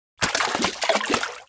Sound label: aloha bonus fruits; hawaiian